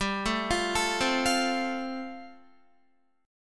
G 7th Suspended 2nd Suspended 4th, Guitar

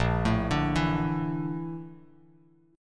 Bb Major Sharp 11th, Bass Standard; arpeggiated; JGuitar

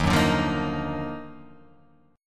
D sharp Minor Major 9th; chordshape

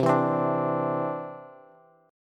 C Major Add 9th, Bass; strummed

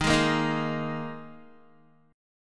D sharp Suspended 4th, Guitar

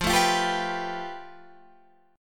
A 7th Suspended 2nd Sharp 5th F, chord

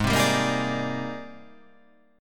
G sharp Major Sharp 11th, chord

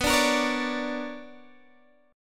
B Minor Major 9th, Guitar, Open D

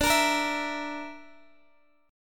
D Suspended 2nd Sharp 5th D, Guitar, DADGAD; Dsus2#5; strummed

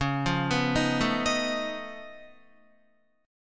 C sharp Augmented 9th C sharp Guitar; arpeggiated; chordshape

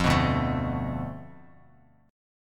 D Minor 6th Bass Standard; Dm6 strummed; JGuitar

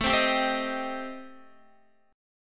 A Minor 7th Flat 5th A Guitar, chord; Am7b5; strummed; chordshape; x,12,10,12,12,10

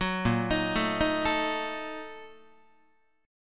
Bb Major 7th Suspended 4th Sharp 5th, Guitar; arpeggiated; JGuitar

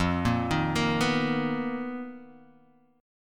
B Major 7th F, chord; Bmaj7/F arpeggiated; JGuitar

